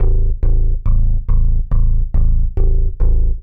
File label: TECHNO BASS 140 A